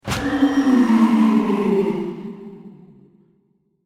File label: HAUNTING HOWL